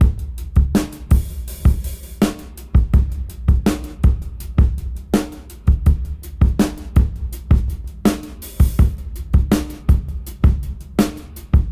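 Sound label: Greendry 82 BPM Reverse; AMG - Keith LeBlanc Phat Butt Jams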